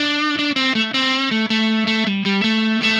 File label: Happy Pub Guitar